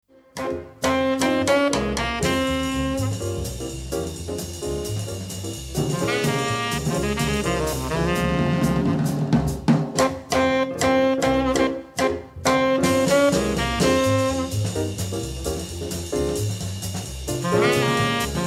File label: Quartet, Saxophon